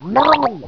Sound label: gurp; quake2; orc